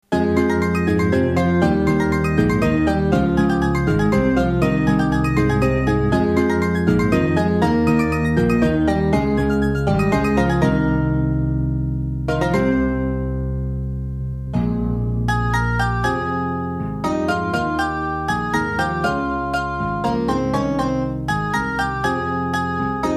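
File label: Undine mandolin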